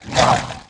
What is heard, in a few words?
Attack Slash, Mutant